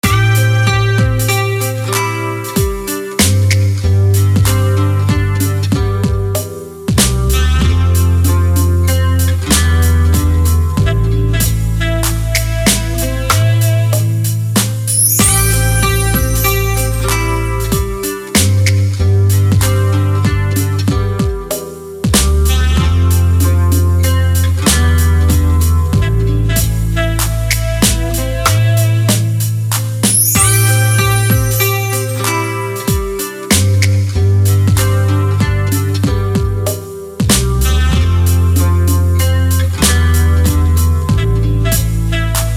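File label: melodic beat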